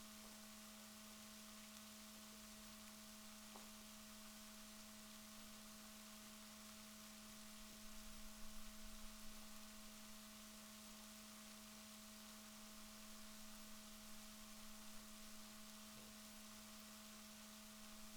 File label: rorquals negatif, Balaenoptera Physalus Chile